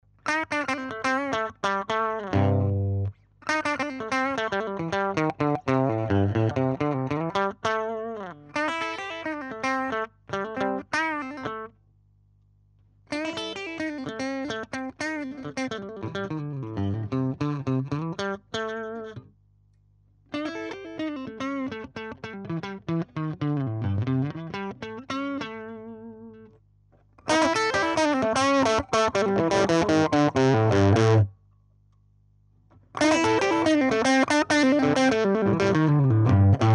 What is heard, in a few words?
Lead